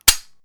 Empty Gun Failure To Fire Trigger Pull

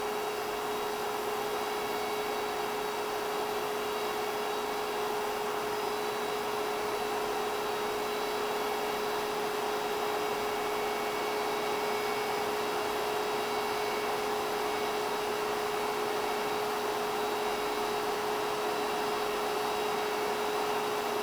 Projector Digital Fan Strong Roomy

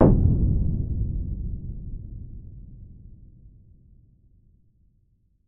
Medium Far Explosion

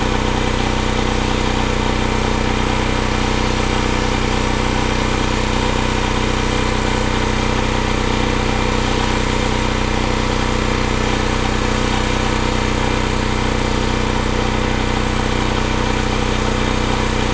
Generator Running

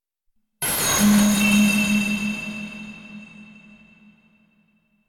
Feedback Glass chain

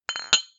Glasses Clink

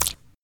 Slime Jump

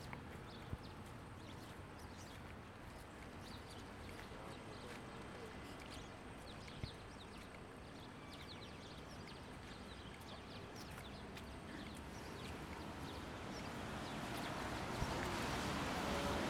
City Birds

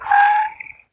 Breaks

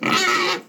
Pig Squeak

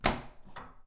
Lever Latch Open

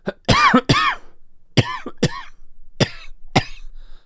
Double Coughs Getting Worse

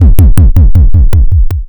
Retro Angry Neighbor Knocking Your Door

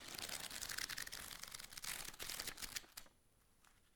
Pages Turning Fast

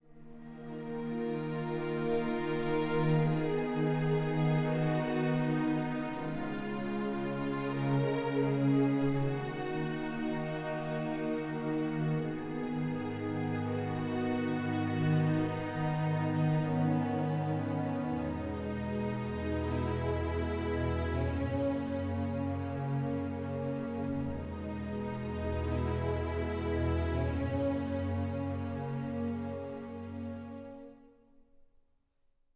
Sad Melodic Background Music Cinematic Strings

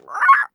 Dino

